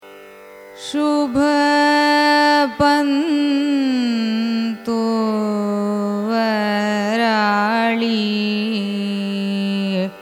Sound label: raga subha pantuvarali signature, carnatic music